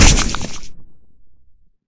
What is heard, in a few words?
mush death; Risk of Rain